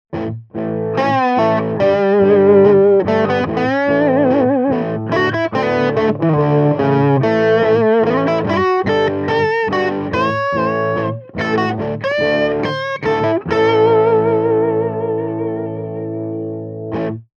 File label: LP Rythm LP Lead; TubeUlent